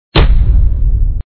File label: damage; run